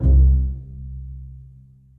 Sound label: Double Bass Section Pizzicato, Orchestral Strings; Ableton